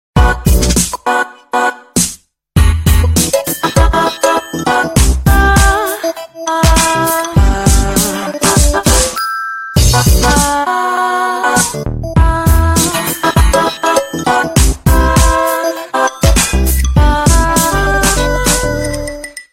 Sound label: nokia aa ringtone